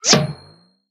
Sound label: Vanityitem Equipped